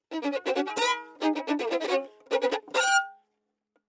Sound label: marcato; Baschet; Groupe Geste; Geste Recherche; Extrait Conférence